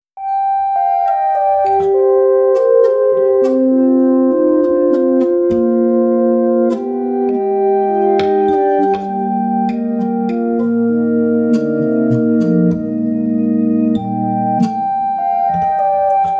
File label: Xbow Guitars ebow Acoustic; Soniccouture